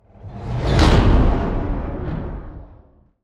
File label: riser impact; transition sound effects